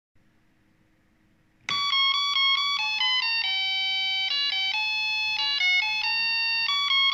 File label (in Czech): Tesla bytovy zvonek melodie plast